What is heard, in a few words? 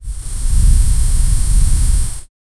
flame charge charging